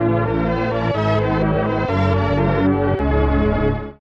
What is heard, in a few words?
Got An Item; Metroid (USA)